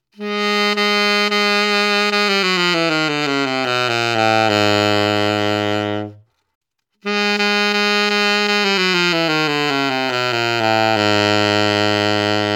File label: Legere Bb to Bb Chromatic